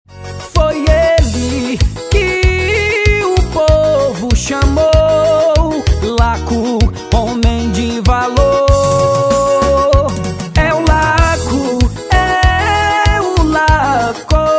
jingle; vereador prefeito eleicao campanha politica 2024

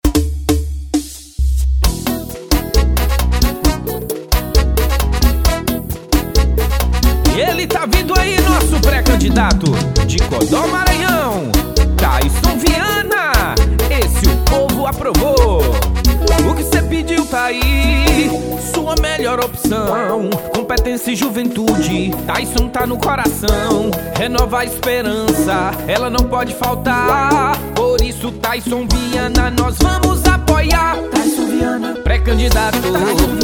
Jingle Vereador Prefeito Eleição Campanha Politica Pagodão

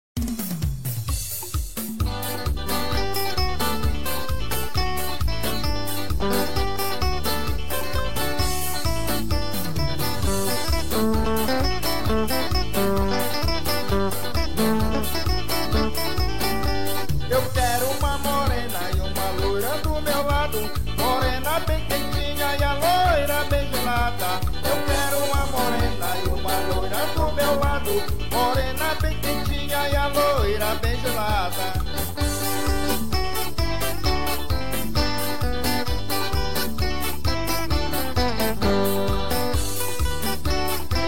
guitarrada

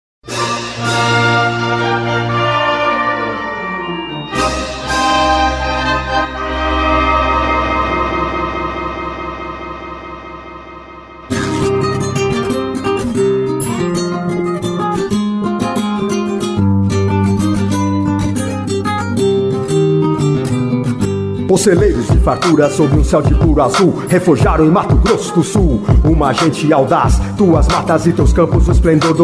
hino do MS versão rap balanço

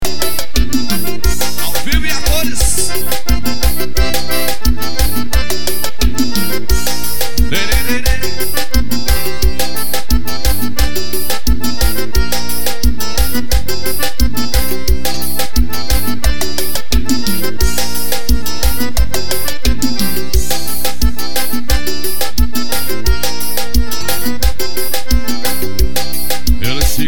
forro, teclado

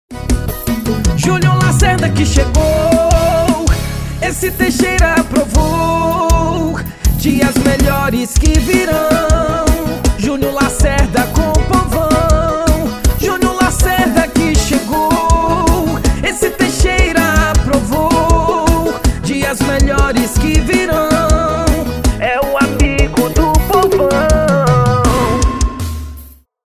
jingle vereador prefeito eleicao campanha politica

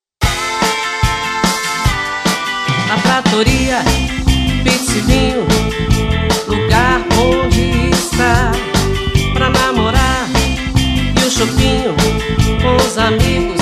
Jingle Comercial; La Tratoria

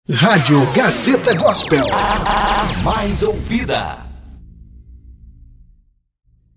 vinheta gospel

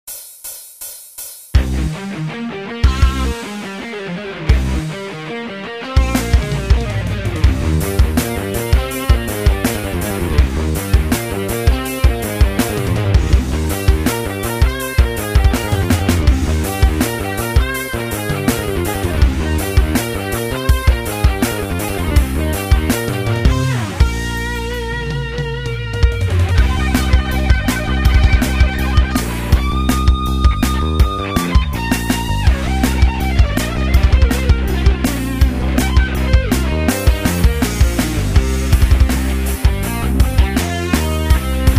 solo; Solo Fei

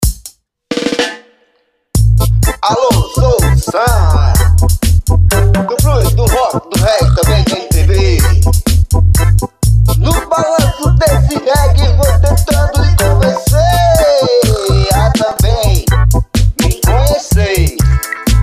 reggae

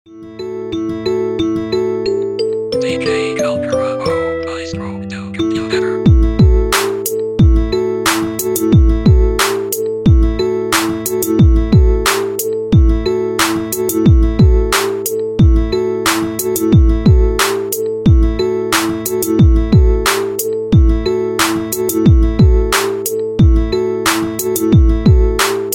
beat de rap

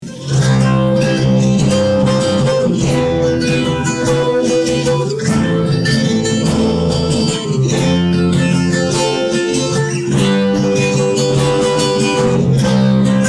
instrumental violoes

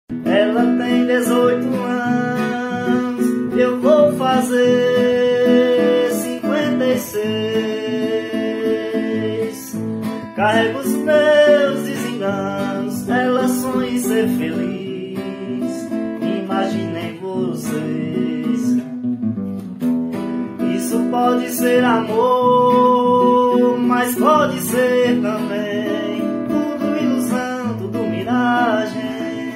samba

